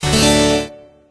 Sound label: startup, windows sound schemes; leonardo da vinci; windows 9x